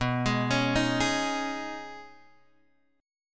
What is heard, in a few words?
B 6th Add 9th B down Guitar Open G